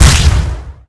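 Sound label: explosion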